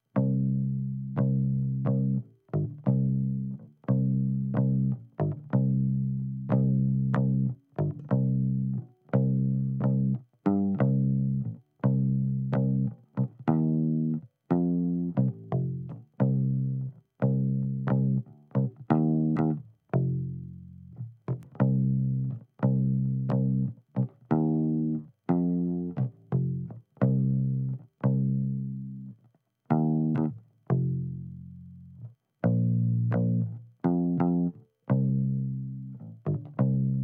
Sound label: 60's Combo